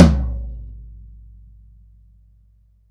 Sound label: TOM, Drum Dry; East Collexion; Drum 1